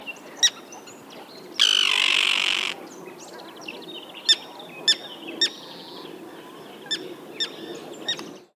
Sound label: Common Tern, Sterna hirundo; gulls terns